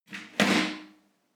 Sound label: shower glassdoor close; haus garten; badezimmer